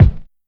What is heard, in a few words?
perfect bass drum g sharp key